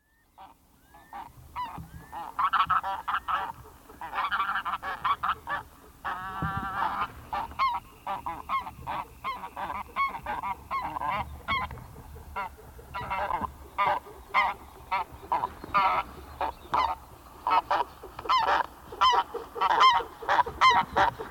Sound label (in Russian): gumennik golos